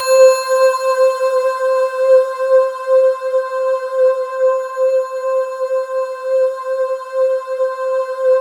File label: C5, Synth Leads